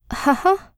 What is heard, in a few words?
laugh; Eternal Return; Katja; ENG